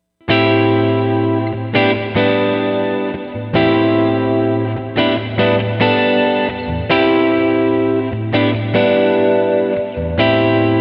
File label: plate; BigSky; reverb; Mirror View